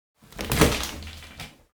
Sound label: Shower door plexiglass open